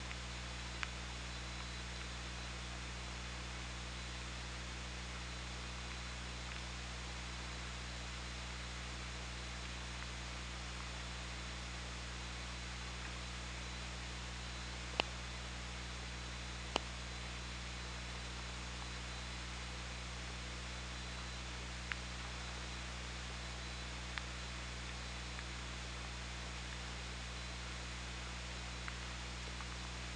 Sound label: OrcaLab